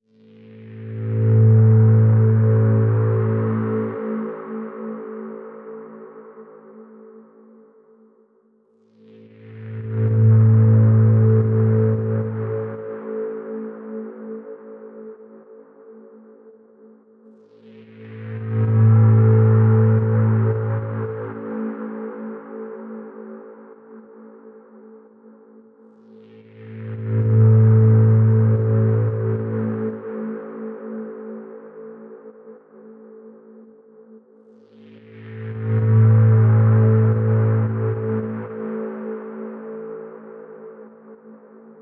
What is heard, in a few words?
ambient; space